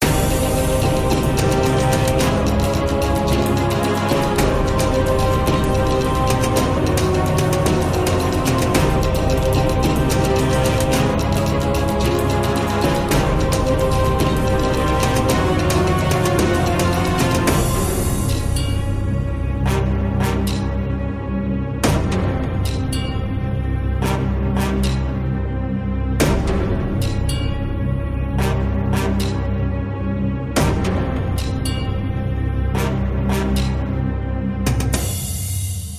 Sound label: Epic Battle; Epic battles, high-speed chases and other action-packed scenes.